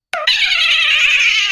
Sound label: boom